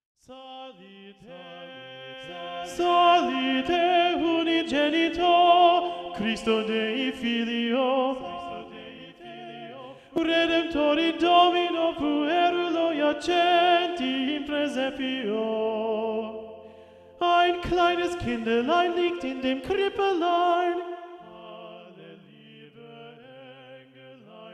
psalite unigenito singt und klingt alto predominant, schola